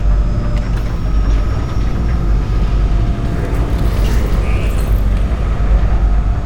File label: airship takeoff